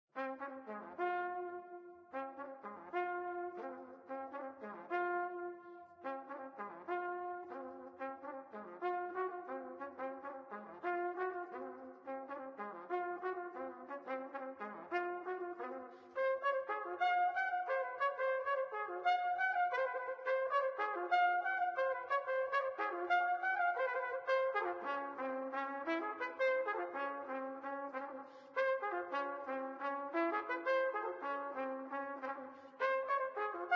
Trumpet; Zomboid; Lifestyle

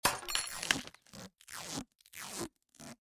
File Crafting; Zomboid; The Workshop

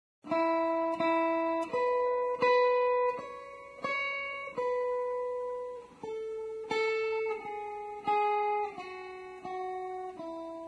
Guitar Electric Twinkle Twinkle Little Star; Instruments

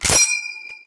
M1 ping; Zomboid; VFE

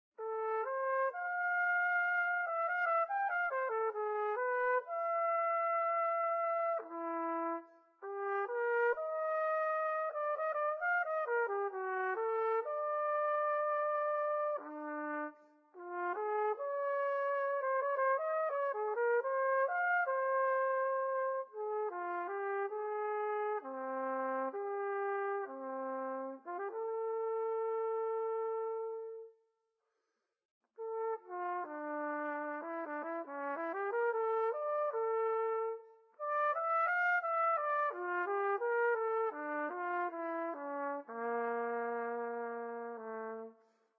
Trumpet; Zomboid; Lifestyle

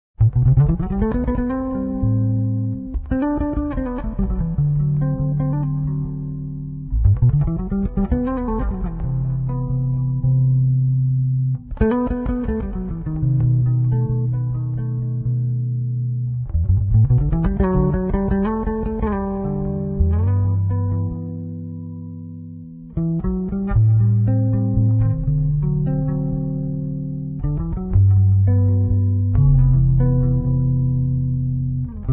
Guitar Electric Bass; Zomboid; Lifestyle

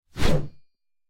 Heavy Blade Swing; Scrap Weapons; Zomboid

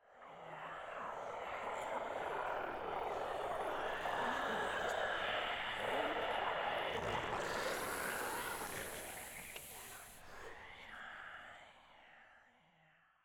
Zombies; Zomboid; The Calm Before The Storm

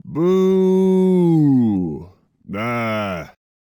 Man Booing; Zomboid; Lifestyle

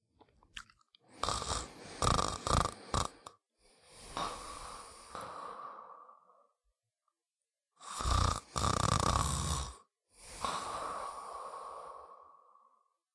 snore; Zomboid

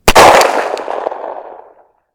Firearm SW642 Shot; Zomboid

